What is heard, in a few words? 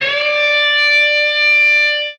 siren, Guitar; Notes